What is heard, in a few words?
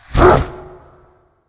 balrog attack; spawners mobs